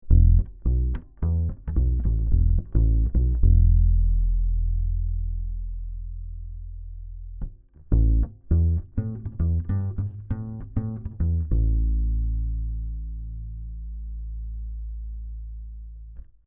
BASS PIEZO

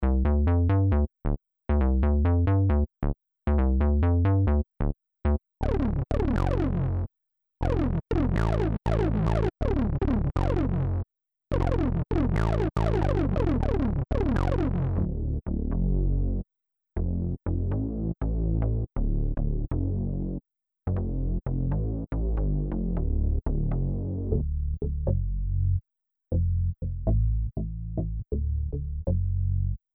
retro bass

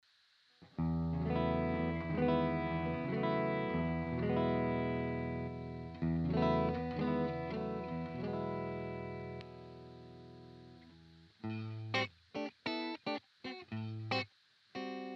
EHX 5150 Clean PRS DI Out

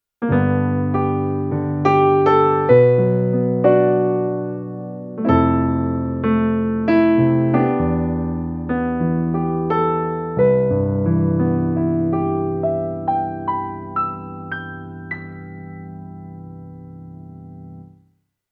Korg Liano Piano

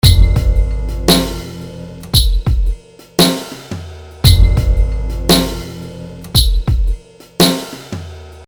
Drums MASCHINE DRUM SELECTION

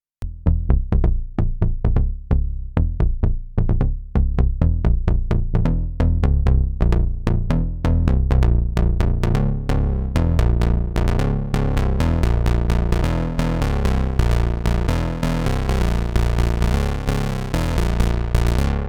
Bass Vintage

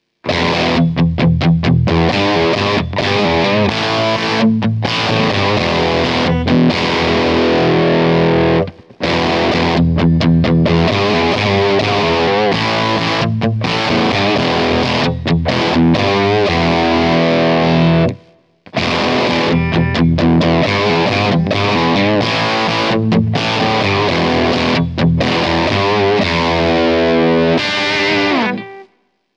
Fulltone Queen Bee Fuzz 12 Uhr Bass Cut

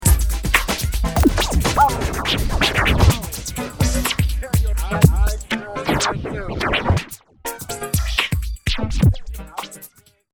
Scratch

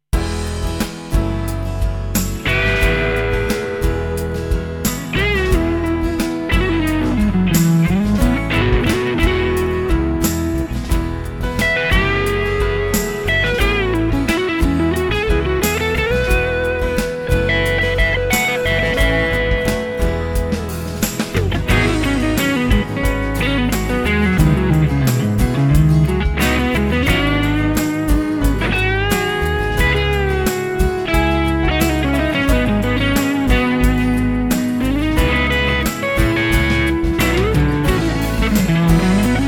Pop Deluxe Lead

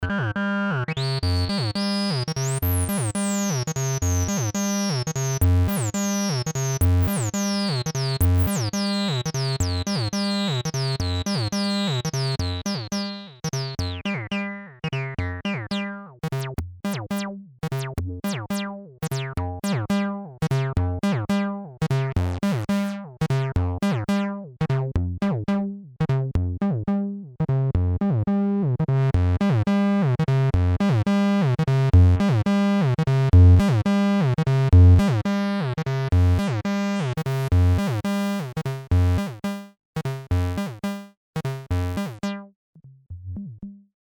TD-3 Pulse With Overdrive

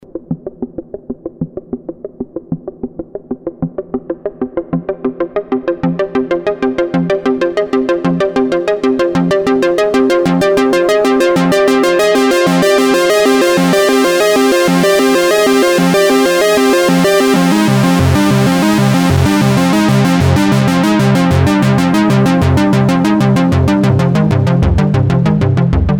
chords

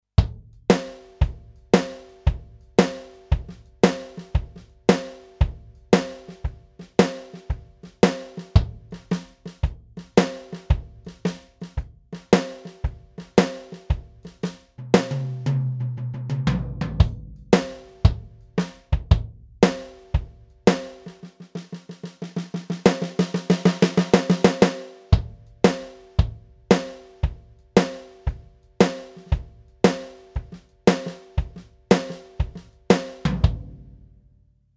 Vintage Studio

